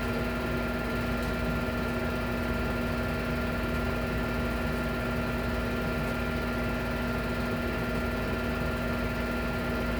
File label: frame joint; minha lavadora samsung esta fazendo barulho; home-appliances